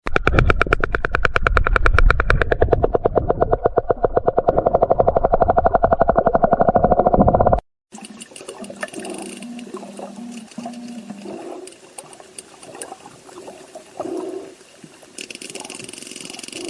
Sperm Whale

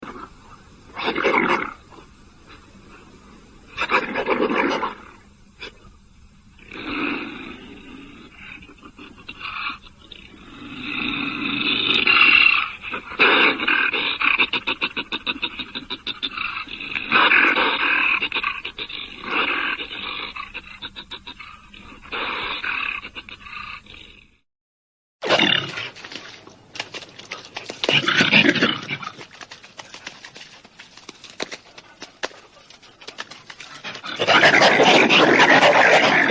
Wolverine

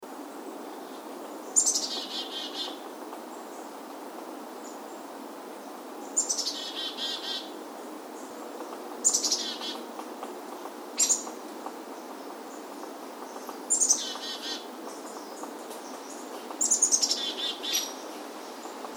Black Capped Chickadee Sound

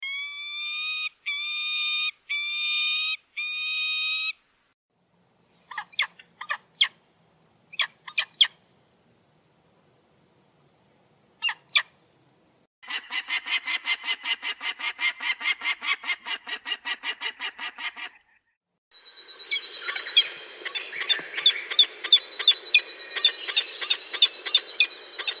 Peregrine Falcon

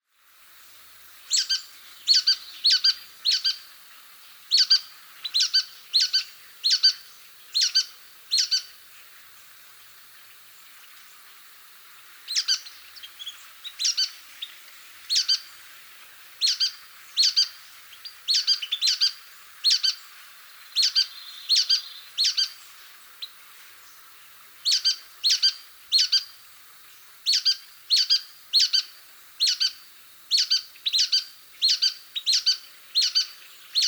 Nuthatch Sound

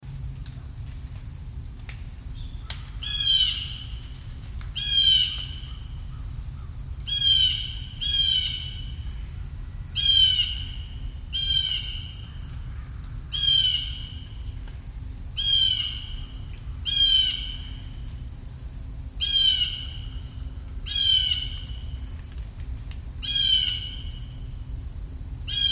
Blue Jay Sound

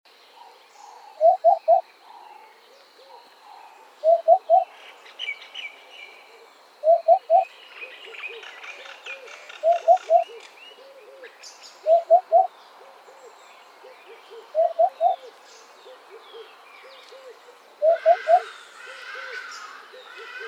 Hoopoe Sound

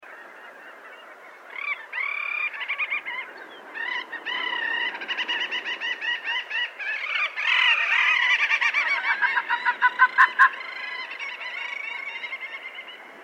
Arctic Fox Sound

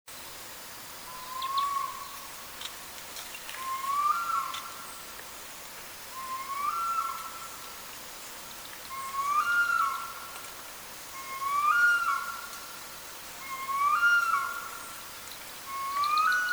Harpy Eagle Sound

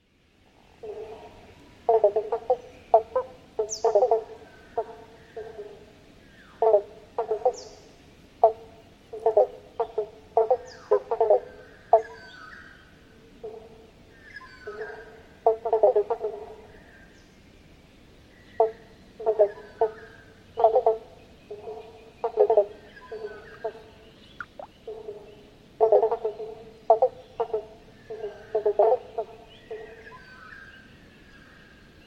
Croaking Sounds, frog sound

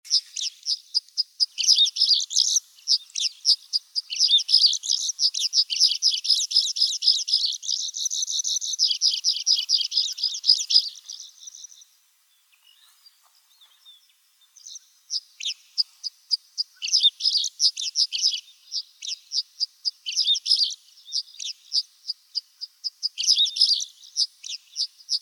Pipit Sound

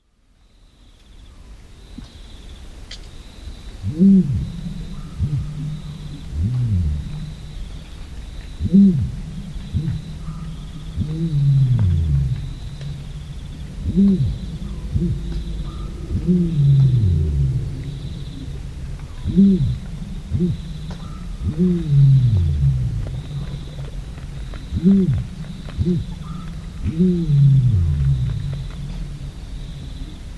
Ostrich Sound

